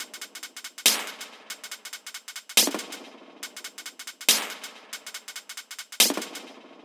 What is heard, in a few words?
140 bpm Perc Loop, Dubstep; Cosmic